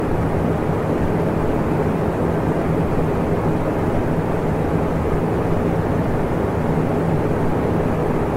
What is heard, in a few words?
Night Ambience; Five Nights at Wario's 1